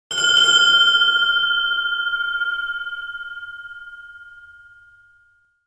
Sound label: small bell